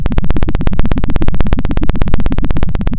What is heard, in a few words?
pop, Sound of a helicopter